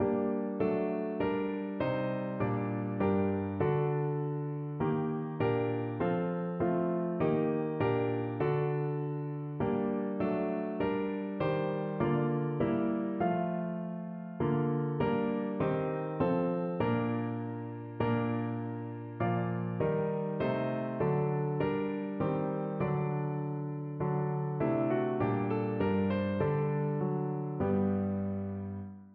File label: ending year, organ